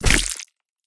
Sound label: melee; SCP-096